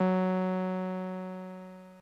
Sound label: ebm, Omnichord; OmnichordGodot